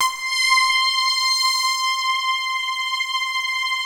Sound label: WAVE PROG C6; Greytsounds; Scott Peers Sound Engineering